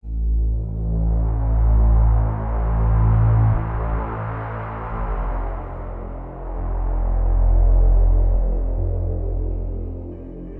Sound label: acid balance; iternal noise; penetration